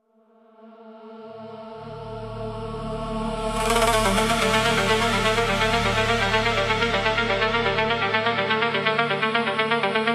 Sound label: Remix